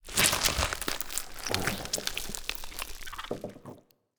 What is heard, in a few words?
hatch, alien